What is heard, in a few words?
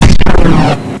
hit; quake2